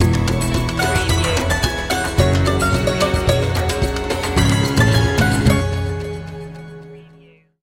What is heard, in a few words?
eastern discovery sting